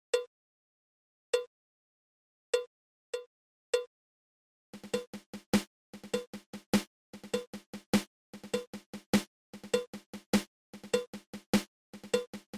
Single Ratamacue

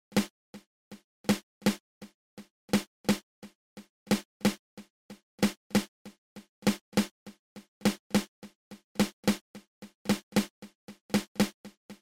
Patafla fla open close open

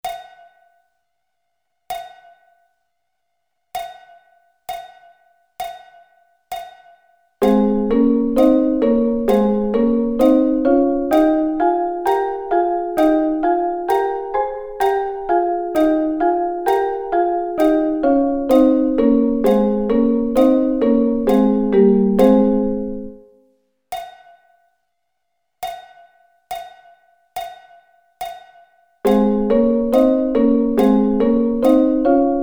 Ab Double Stops

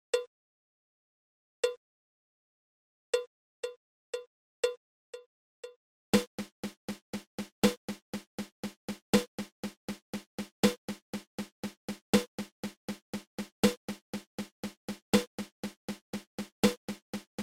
Paradiddle diddle

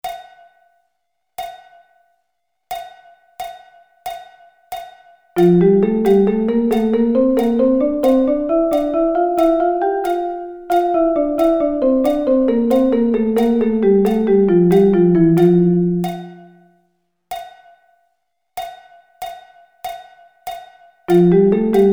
F Double Sticking

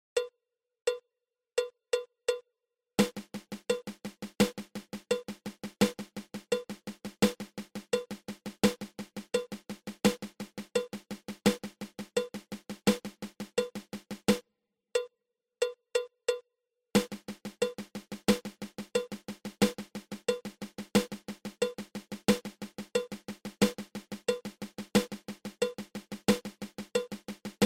Triple Paradiddle

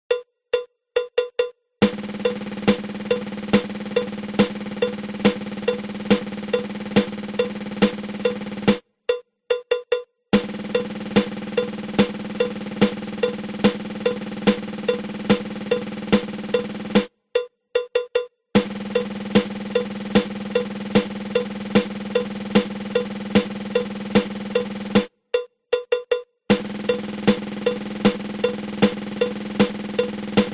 Fifteen Stroke Roll

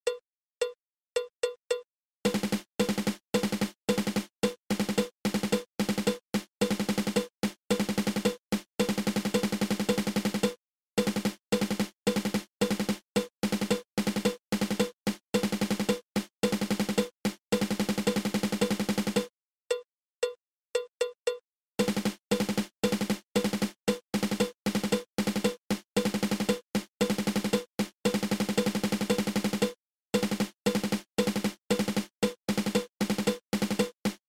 Triple Stroke Roll

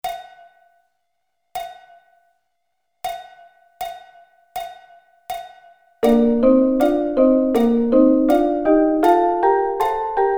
Bb Double Stops

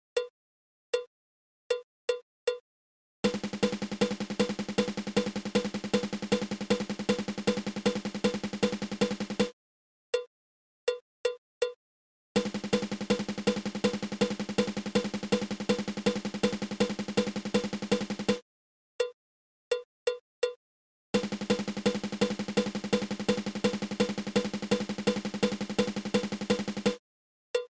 Single Paradiddle

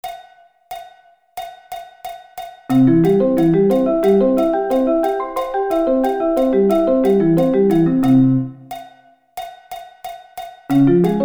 Arpeggio Inversions

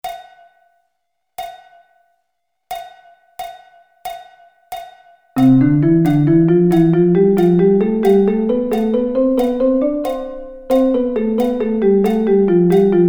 C Double Sticking

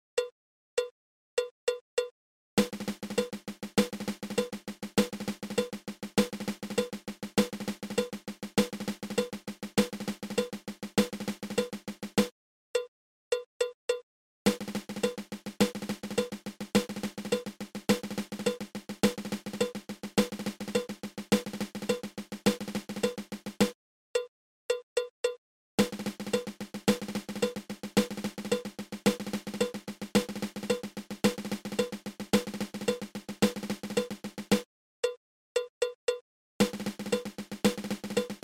Drag Paradiddle 2

